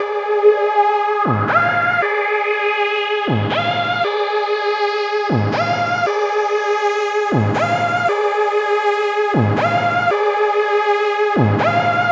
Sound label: NRG Lead, trance